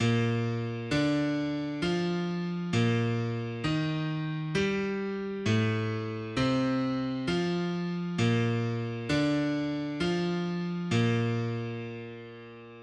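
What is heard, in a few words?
sing midi b flat; B♭ Major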